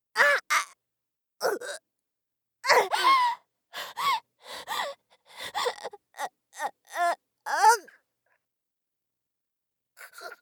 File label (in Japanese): 滅多刺し; 効果音なし